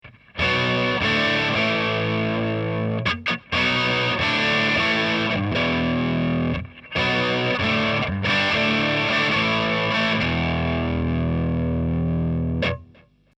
gain full prs

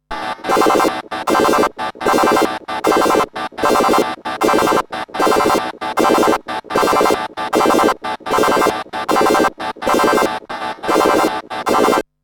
ESQ1 ANTBUG; Arturia SQ80 V

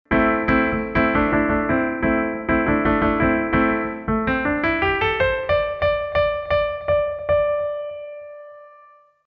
Artiphon ORBA 2 Lead Piano LEAD

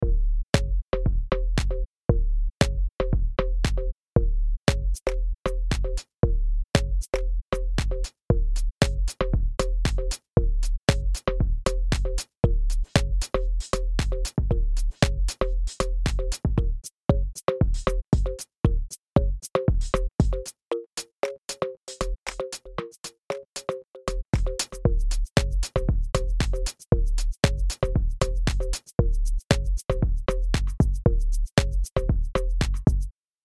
BT Vintage

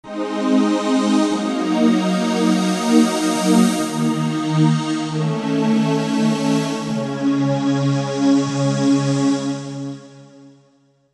stereo pad

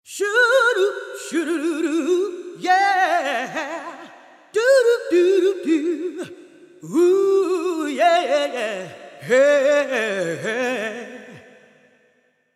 BOSS VE500 Long Plate

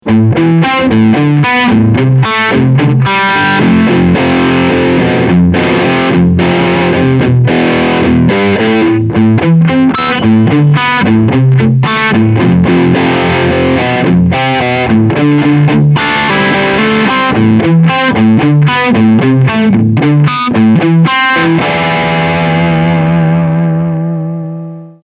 Axe Attack

